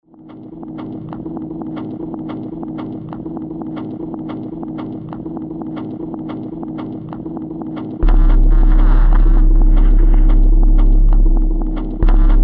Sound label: Sample Beat; Bridge Music Fall 2017